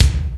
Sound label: smooth kick sound b key